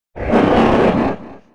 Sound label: roar; GhostDragon